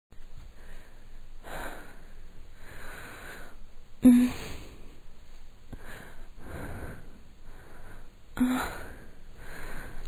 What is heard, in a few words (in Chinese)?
女人呻吟声 女人